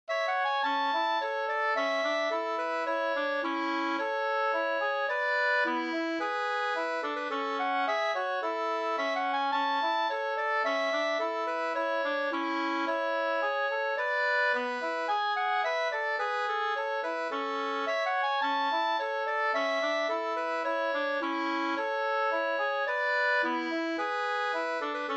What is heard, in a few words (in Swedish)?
ganglat